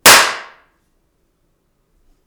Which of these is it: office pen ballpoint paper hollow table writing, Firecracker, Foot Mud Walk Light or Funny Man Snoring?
Firecracker